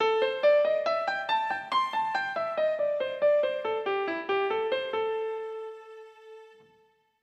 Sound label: blues